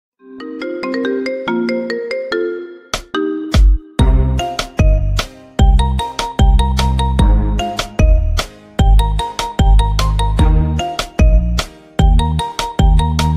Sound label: Iphone 12 Marimba Remix